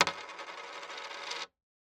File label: Coin Drop Spin Wood